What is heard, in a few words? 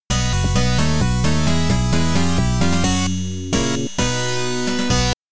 Game Over, MS-DOS Version; Wacky Wheels